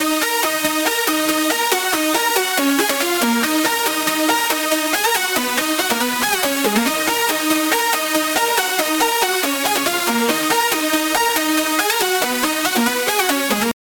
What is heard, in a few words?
supersaw, complex, epic, arp, D# minor, 8 bars, 140BPM; EDM; Audialab EDM Elements